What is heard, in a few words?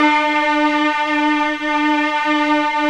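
strings slow, Vlns; Roland L-CD702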